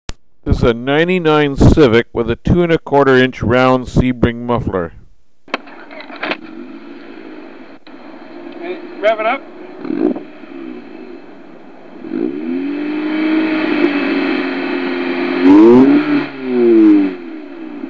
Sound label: civic round sebring